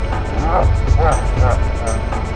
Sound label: thwomp laugh